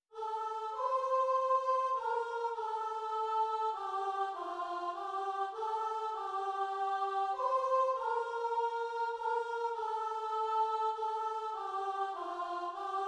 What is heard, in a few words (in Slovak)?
sopran, zborove skladby vianocne